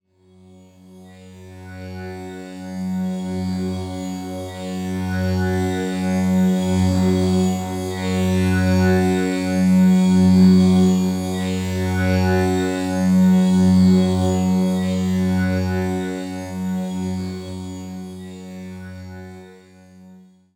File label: Pad F#; One-Shots; Pads; Ghosthack; Color Bass Essentials